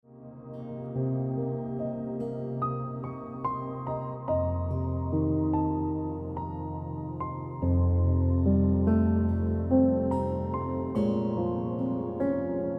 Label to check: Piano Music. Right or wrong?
Right